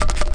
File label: scuttle, pupae; svencoop